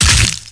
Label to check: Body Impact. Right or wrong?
Right